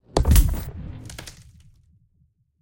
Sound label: Wire Fall; Game rip; MiSide